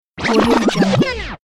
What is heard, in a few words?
record back spin